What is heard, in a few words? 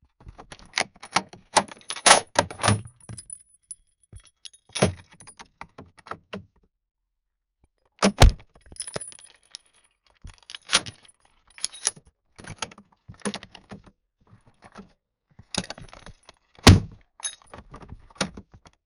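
lock picking a locked crate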